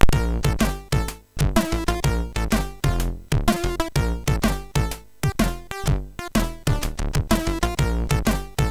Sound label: Check, MOS6581R3